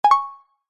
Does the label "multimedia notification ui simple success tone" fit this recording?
Yes